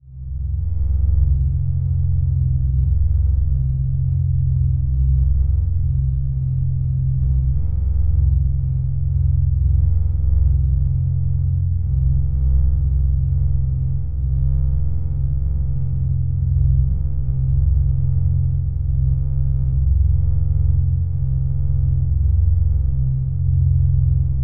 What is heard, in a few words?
Drone Bed Low Bass Static; Production Element Imaging; AdobeCC